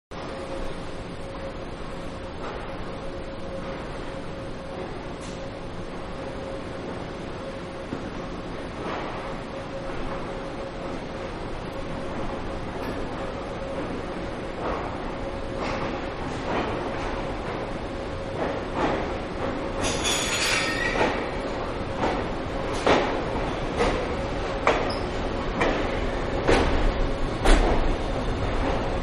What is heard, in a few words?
Diesel Train Arrival Middle, Train-Commuter-Arrival-Middle Of Track; Vehicles; Trains